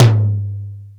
DRY TOM 2; Greytsounds; Scott Peers Sound Engineering

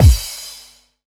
BD-FX, Dance Mega Drums; Best Service

